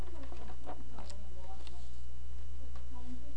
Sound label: cough